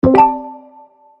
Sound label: inbox tone